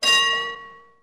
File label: Bells